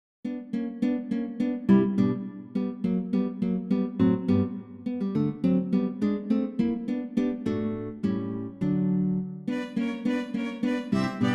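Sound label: Bagatelles with string quartet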